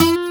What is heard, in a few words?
Guitar Missed note; Levels Hub Swamp 01 Music Ray